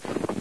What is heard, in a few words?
snow, footsteps; Source Engine; cstrike